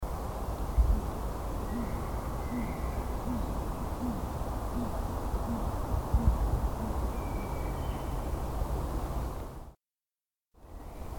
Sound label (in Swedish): Lappuggla